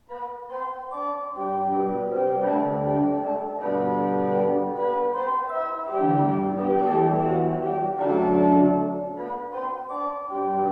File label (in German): Orgel Ausgangsstück; ev-kirche-rothenburg-ol